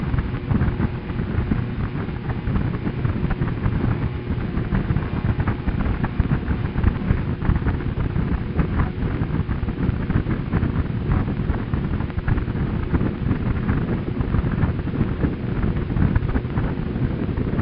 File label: scintillation laser, optical comms; Dead carrier with laser